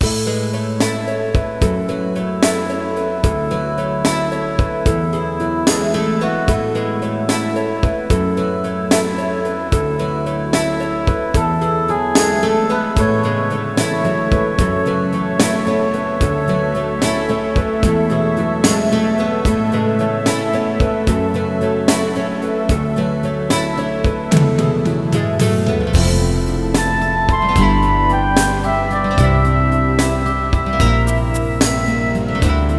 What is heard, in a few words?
bgm